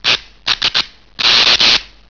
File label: spark; mgs